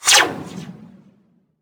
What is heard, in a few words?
TRAP TRANSITIONZ